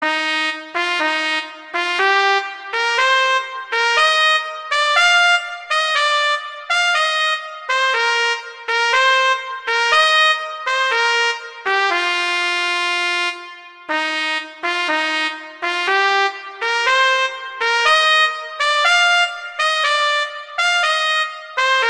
Humoresque; Very Easy Collection; Dvorak

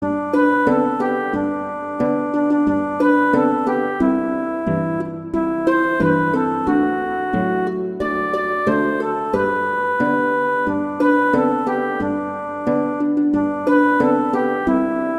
Jingle Bells, Christmas

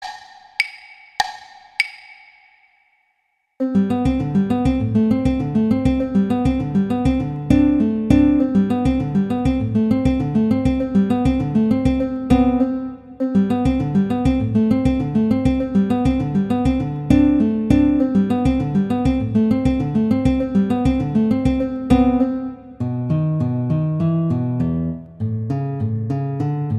Allegretto Violin; Paganini; Sonatas Op1 Vl Gu; Sonata No 2

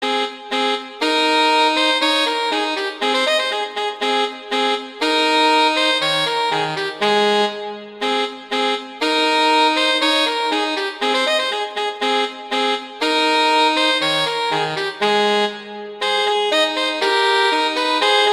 Duet; Mozart; Duets

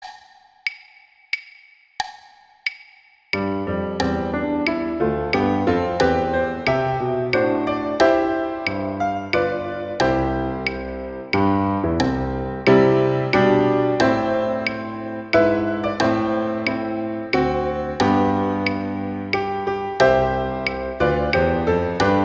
The Star Spangled Banner acc

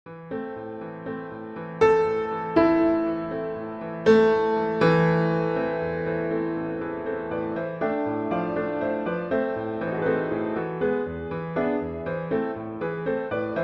Andante con moto, Gondola Song; Mendelssohn; Op 62; Venetian Gondola Song

